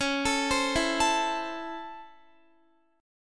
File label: C sharp Minor Major 7th Sharp 5th C sharp Guitar; C#mM7#5; arpeggiated